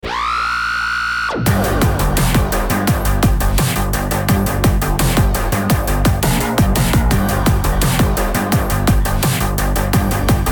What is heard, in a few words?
Engine Room Stomp; Disney Infinity 2.0 Marvel Super Heroes